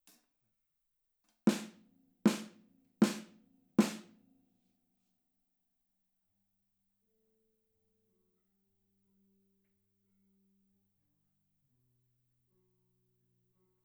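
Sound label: overhead stereo, drums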